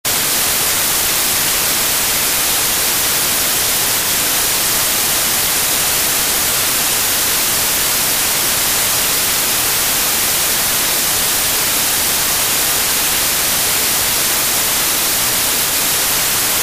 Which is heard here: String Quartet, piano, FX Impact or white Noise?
white Noise